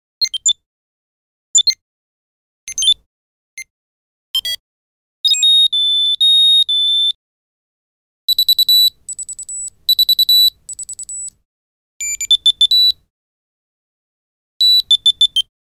UI Sound Set for Smart Keychain; Nokia Ringtones

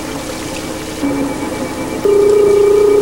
Sci Fi Loop; Sound FX

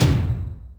Yamaha RM 50 Toms

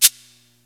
Cabasa, Roland D-110; Drum Samples

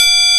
Tubalar Bell, Korg M1; Drum Samples

